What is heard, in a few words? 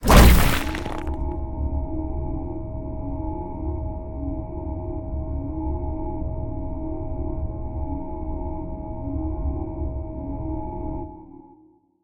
portal; Smite; god Janus